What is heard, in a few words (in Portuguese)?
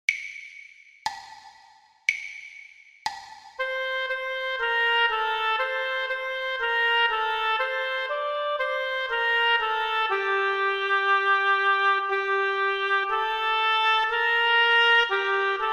percepcao ditado